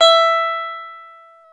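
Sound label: E 12 String guitar; Club-50 - Foundations Roland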